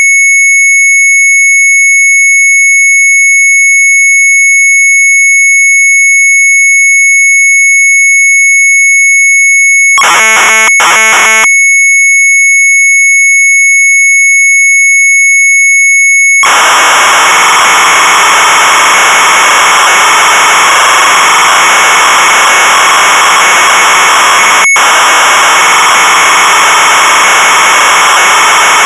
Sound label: MZ800 Tape; QD-CMT Copy; retromelsarchive; sharp mz800